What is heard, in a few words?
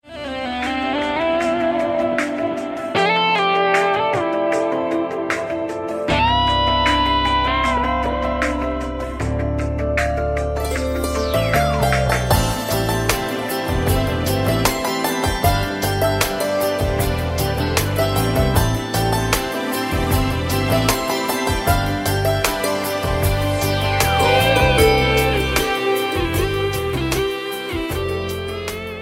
heart touching